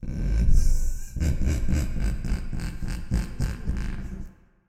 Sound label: Evil Laughter